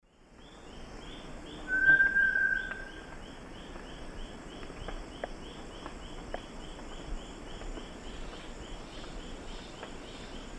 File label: Crypturellus bartletti, song; S. America; Eastern Lowlands